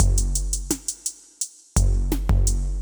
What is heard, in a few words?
Ringtone (Drum); Crowdsourced Sample Pack; Drum Kit; Drum Loops